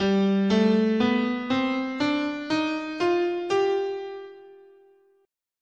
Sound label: G Fifth Mode, scale